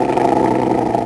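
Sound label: bear attack; DAoC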